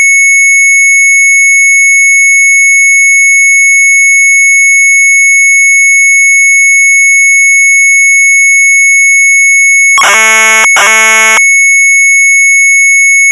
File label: MZ800 Tape; AUTO RUN; retromelsarchive; sharp mz800